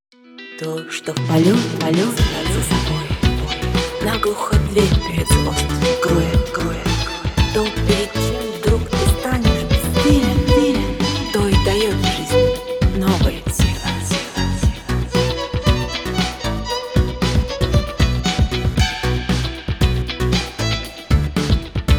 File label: acoustic